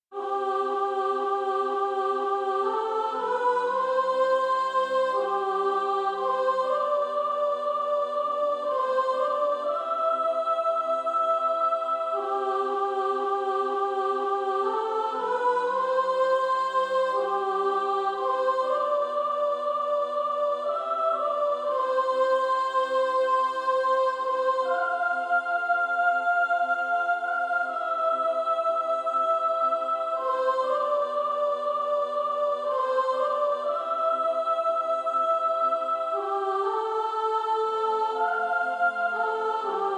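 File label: Female Choir, soprano; SSAA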